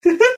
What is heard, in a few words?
hu heeh